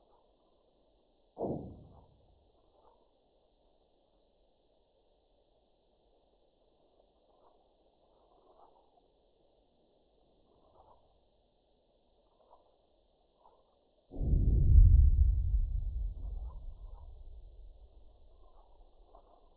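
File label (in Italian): sonificazioni eventi sismici; Piedimonte Matese Magnitudo 4.9 Profondita 10.5 Km 29/12/2013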